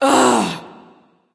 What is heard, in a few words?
ugh; smite; awilix